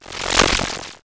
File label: fighting game source foley synthesized electricity surge; flutter